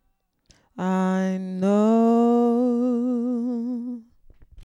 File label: lead vocal